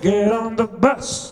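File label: vocals, Roots Disco; USB Soundscan vol.32; AKAI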